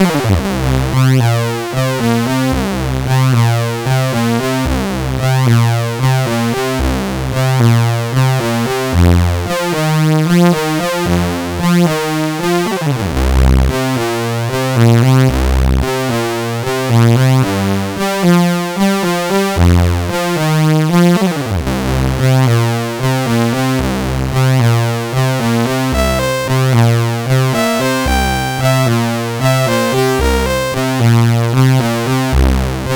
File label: Funk Rock BASIC, CSG8580R5; sid